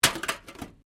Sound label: Can Softdrink Rubbish